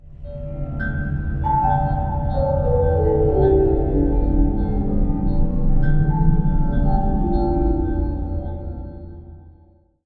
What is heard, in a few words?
Amb bells